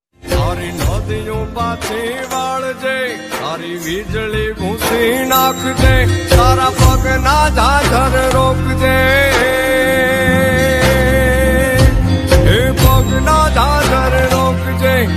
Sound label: gujarati ringtone